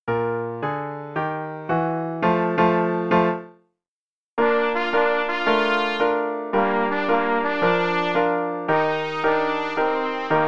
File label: Play-a-long (both parts, no click, qn=112)